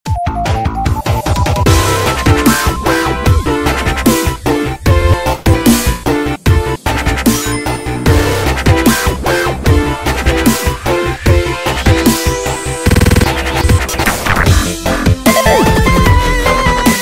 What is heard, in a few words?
remix; sonic dash